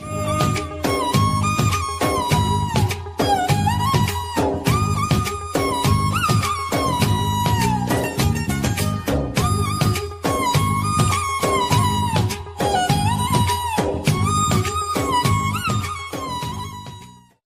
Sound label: bollywood sweet music ringtone